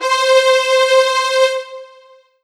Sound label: Kawai K5000W Trem Strg C5